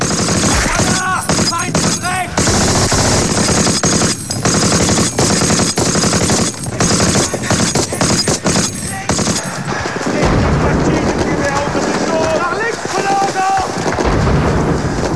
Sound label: ambience; mapsounds